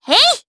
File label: Vox Attack, jp; voices heroes jp; kingsraid